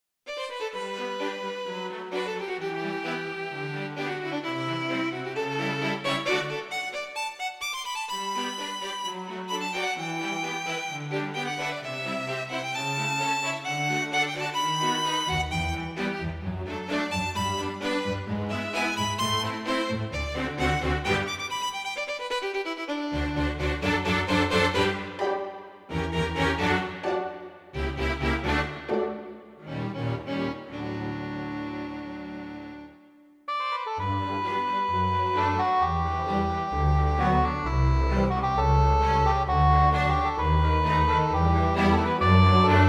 classical tune; Orchestral ROM block demos; KURZWEIL K2500 factory demos; HJ Classical Tune

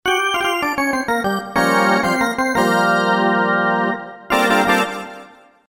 organ

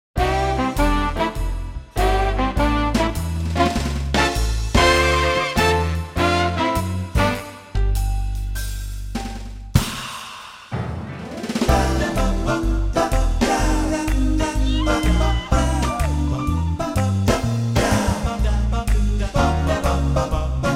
swing

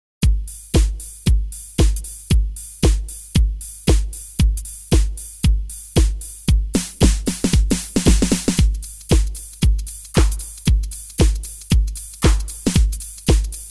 house

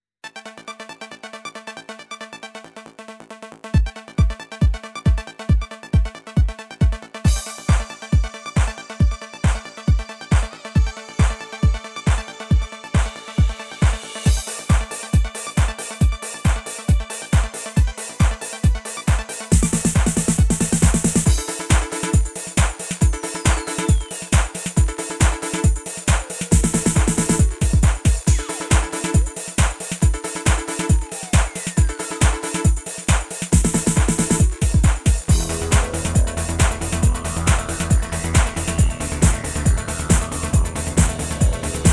trance

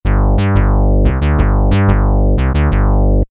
bass

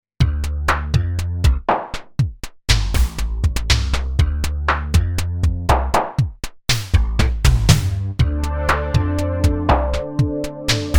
akai elcombo

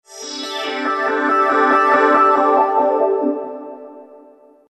LFO pad

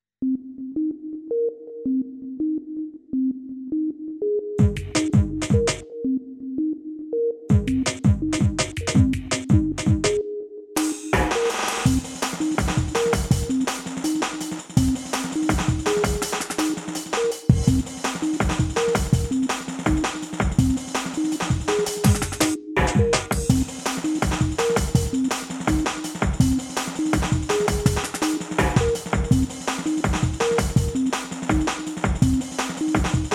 jungle

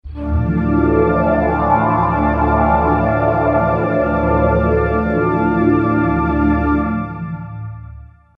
rezo pad

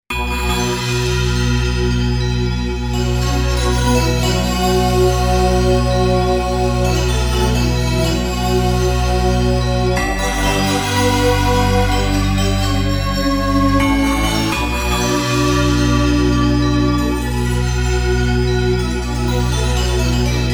akai; s3000

